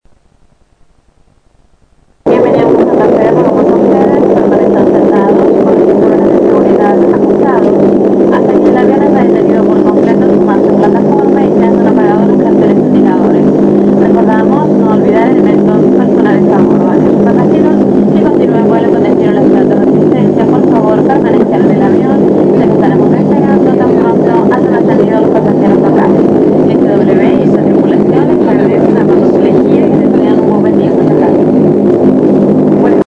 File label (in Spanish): Dash 8; Southern Winds; Aeropuerto Santa Fe